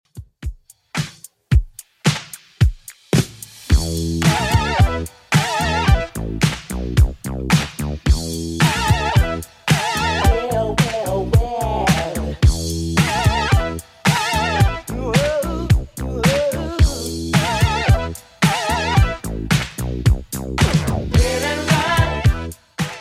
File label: 80s Redrum 110bpm